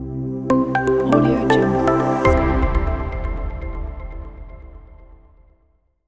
corporate logo

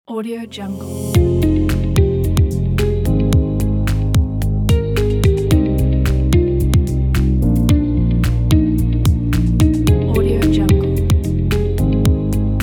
corporate ambient

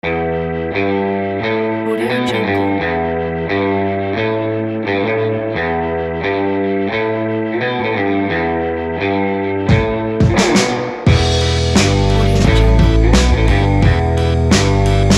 mafia

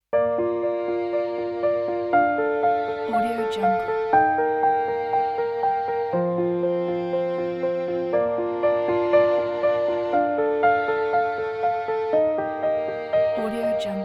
beauty piano

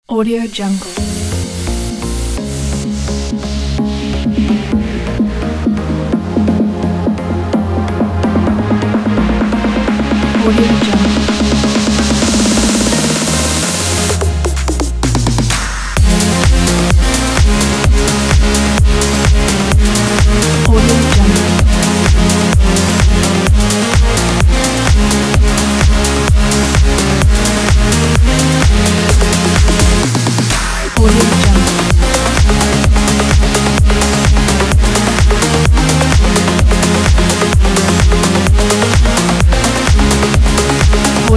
edm progressive

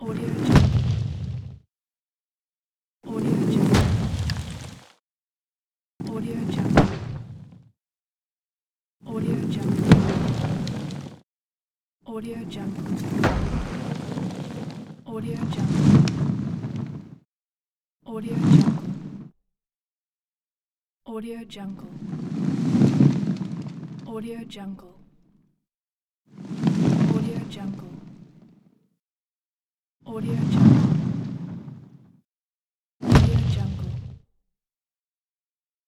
fire magic spell pack

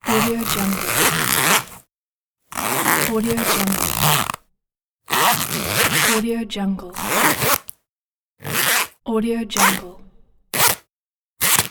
button up jacket; asepticsounds

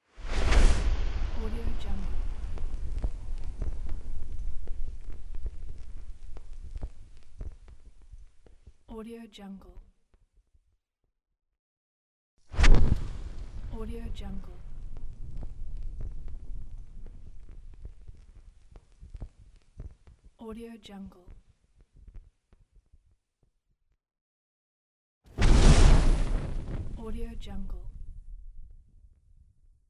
torch deep ignition

